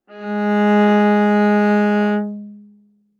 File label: Viola arco ff sul G Ab3; MIS Pitches - 2014; Strings